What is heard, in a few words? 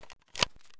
sv98 mag out; Escape from Tarkov